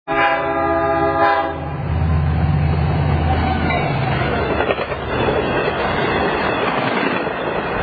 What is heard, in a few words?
DE30AC horn train; Train 2772; lirrhorns